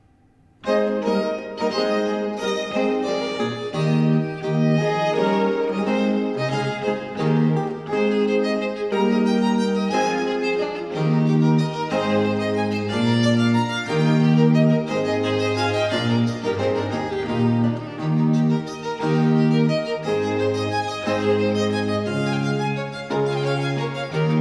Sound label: Sonate 3 in B-flat major - 2. Vivace; Arcangelo Corelli; Corelli - Complete Works; Klassika